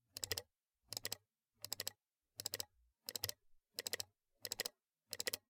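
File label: Low pitched Mouse Double, mouse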